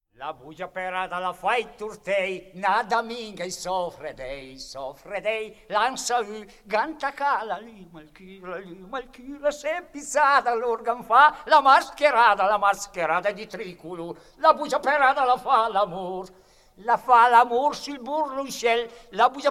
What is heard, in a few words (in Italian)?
la bujaperada la fa i turtel; brianza